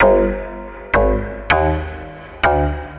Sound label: Echobub Bass; Sonic Foundry (Sony Creative Software); Knutrix Drum & Bass